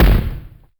silky steel kick drum b key, Royality free kick drum tuned to the B note